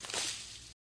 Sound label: Leaves Mvmt Shrt Bru, trees